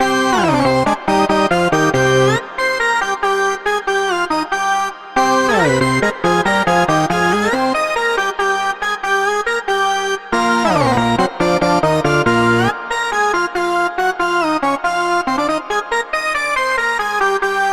OR All Stops + OR Solemn Organ; Altitude

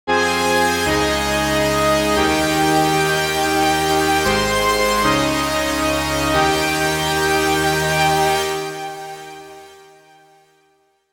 lead; yellow spectrum

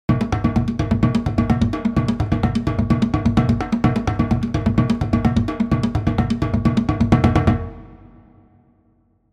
vibes percussion; chiqui audio

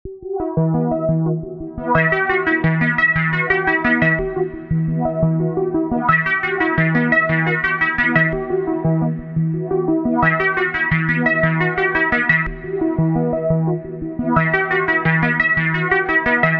drip, trap; 2 8 expansion trap pack bundle purple edition

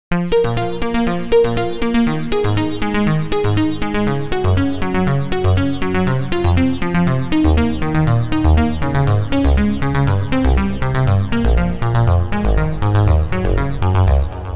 claret arp; the space soundset

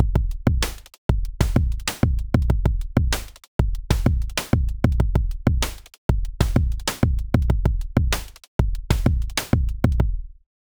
drums

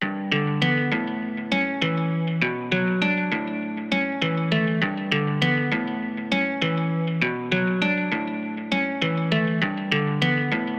warm guitar processed; kern audio

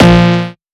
social phonk cowbell; phonk toolkit; rocket powered sound

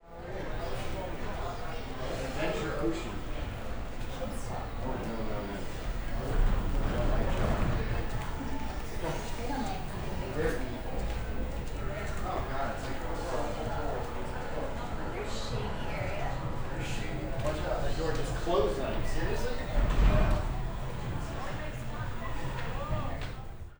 deck 10 cruise ship walla talk; sound galaxy vr universal fx; nova sound

